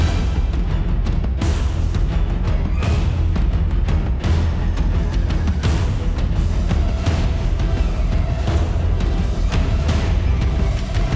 Heavy Action Loop

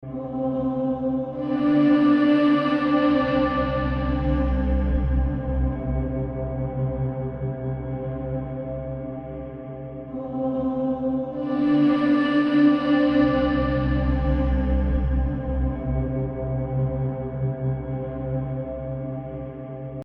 Ring

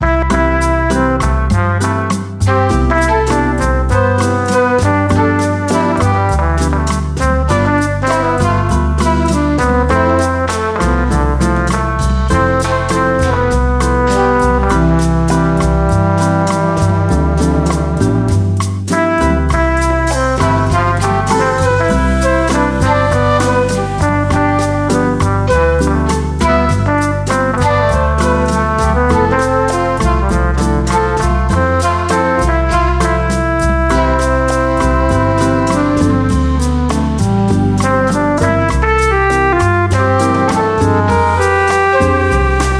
slow tempo

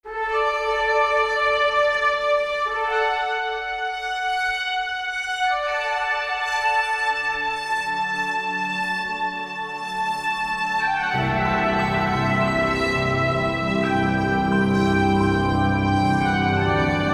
Fanfare

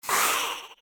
Block Damage VOX; Gut Eater

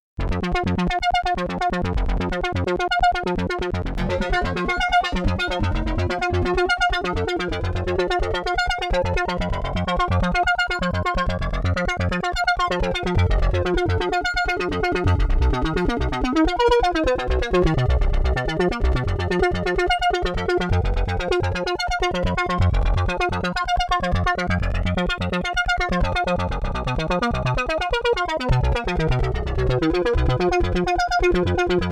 Korg Poly 61; Shapeshifter (Trig U Mode)

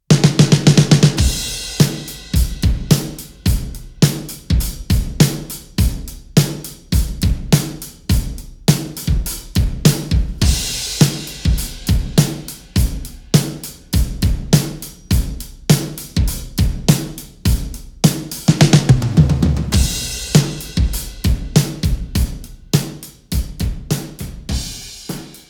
drums

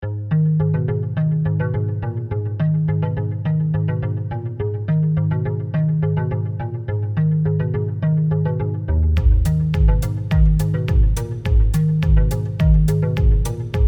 electro soft bpm105; archibald editions

